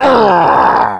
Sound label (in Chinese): 惨叫声; boss4惨叫声2; gamerip; Wild West Shootout